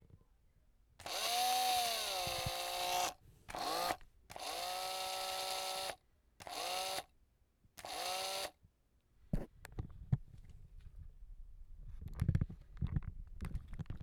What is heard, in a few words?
drill